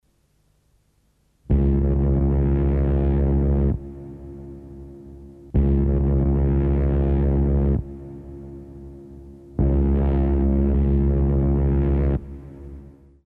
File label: whistle